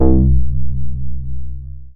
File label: Single Electric Basses, Dance Floor Bass